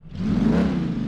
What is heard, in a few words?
start, vehicles, belair; sgmcars